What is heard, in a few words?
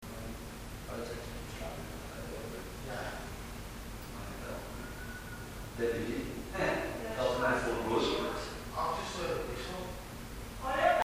whistle